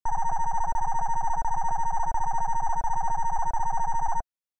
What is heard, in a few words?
Bleeps Rapid Cyclin